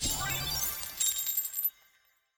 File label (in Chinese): 获得金钱